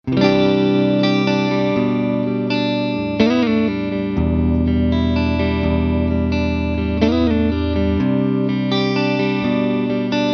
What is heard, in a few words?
retro 30 clean; lonestar